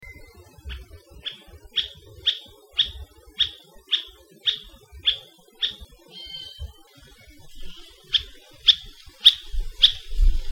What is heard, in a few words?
Elepaio